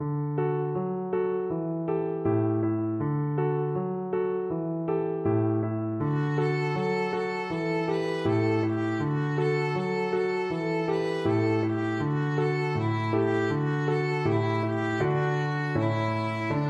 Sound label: voice violin